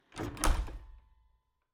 Cabin, front door, split door, oak, large, iron fittings, close, wide; Eiravaein Works; Vaeyan IV